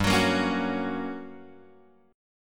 G Minor Major 7th Flat 5th G; GmM7b5; {3 X 4 3 2 2} strummed

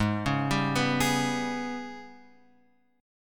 G sharp Minor Major 7th Double Flat 5th; G#mM7bb5 Chord {4 4 5 4 X 4} arpeggiated

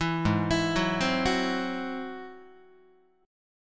G sharp Augmented 7th G sharp Guitar; G#+7 arpeggiated; JGuitar

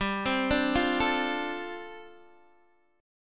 G 7th Suspended 2nd Suspended 4th, Guitar DADGAD

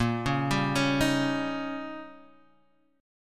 Eb 7th

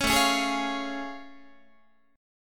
A Augmented Major 7th A, Guitar; strummed; JGuitar

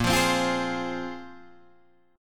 A sharp 7th Suspended 2nd down Guitar Drop D

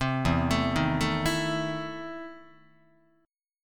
E Major 7th Suspended 4th Sharp 5th, Guitar; EM7sus4#5 arpeggiated

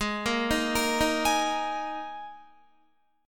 Ab Suspended 2nd Guitar; arpeggiated; JGuitar

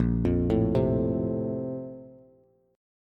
Bb Major 7th Suspended 2nd, Bass; BbM7sus2 arpeggiated; JGuitar

